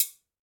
Guiro Top; Latin Percussion; Drum Hits; Wood; Ableton; Factory Packs

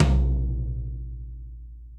Tom Floor Room With A Vista; Drum Hits; Standard Hits; Ableton; Factory Packs; Session Drums Studio

